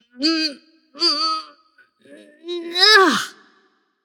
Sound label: nv grunt lift; smite; voicepack; morgan le fay